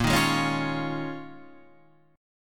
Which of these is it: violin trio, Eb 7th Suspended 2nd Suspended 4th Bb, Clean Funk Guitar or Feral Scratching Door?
Eb 7th Suspended 2nd Suspended 4th Bb